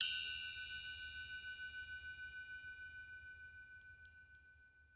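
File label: Elephant Bell Small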